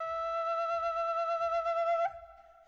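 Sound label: Flute, Hip Hop Exotica, A minor; Big Fish Audio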